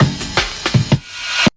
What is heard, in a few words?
162 bpm into rev cym, drum loop; 161 to 180 bpm